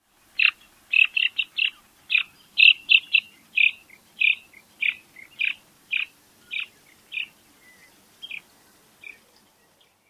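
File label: pectoral sandpiper calls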